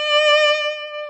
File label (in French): violon synth